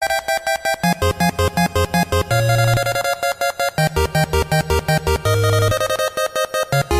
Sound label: 8bit